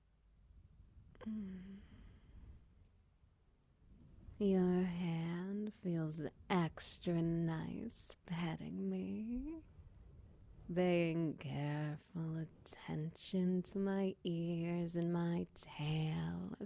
Ambience, Wet Noises

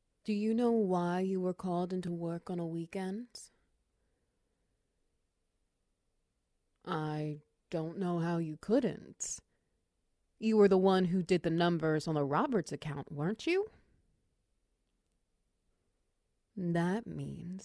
Wet Noises; Unpaid Overtime

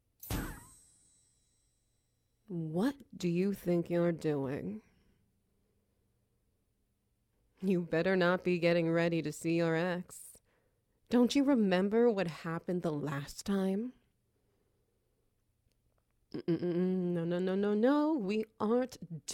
Wet Noises; Angelic Intervention

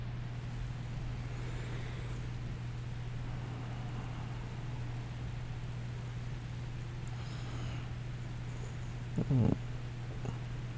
late night thunderstorm; wake up

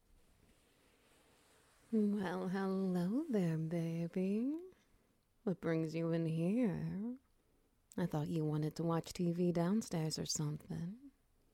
Ambience Petting Heartbeat

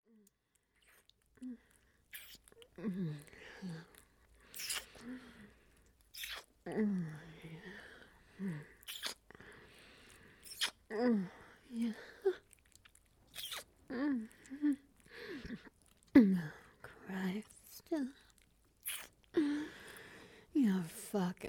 Ambience Wet Noises; Little Alpha

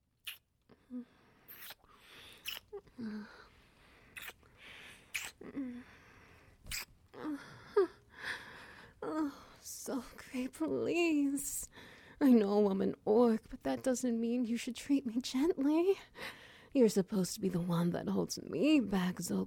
Ambience, Wet Noises

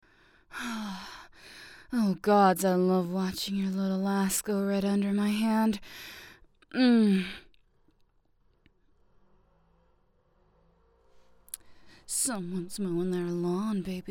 Lawn Mower

